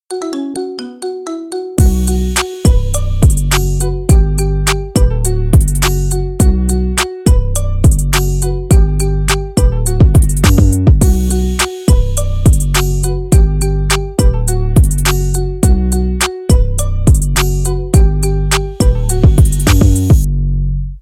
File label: show marimba remix